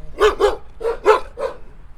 two dogs barking, Two anxious dogs barking at me, while recording them with a Tascam DR40; animals